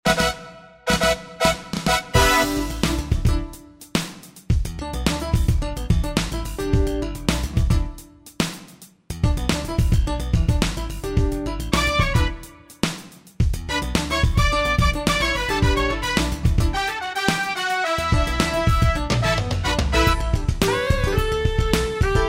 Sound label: Fantom XR